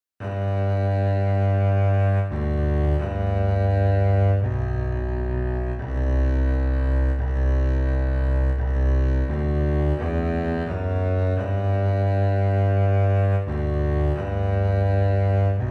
Slow Fox Kontrabass

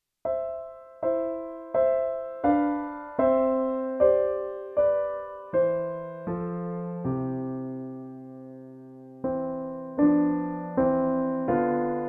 Der Mond ist aufgegangen in C

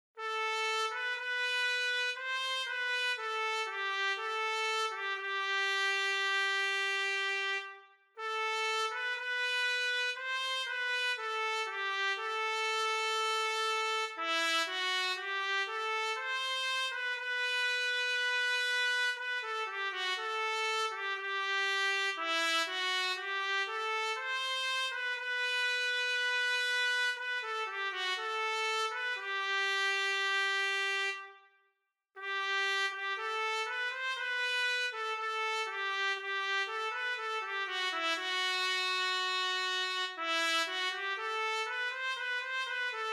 Slow Fox Trompete

